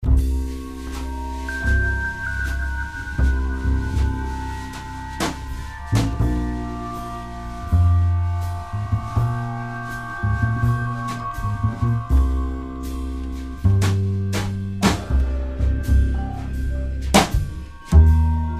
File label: Noire Ambience; l.a. noire the lost tracks